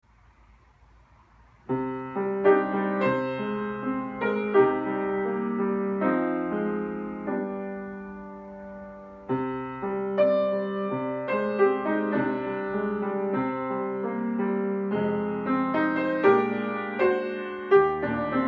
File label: Intermediate Piano